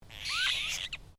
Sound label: Bird Apostle Bird Call